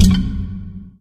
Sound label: lightbridge, footsteps